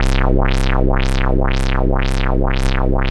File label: BASS C3 R, Jungle; JGL BASS; USB Soundscan vol.05; Explosive Jungle; AKAI; 90s sample CD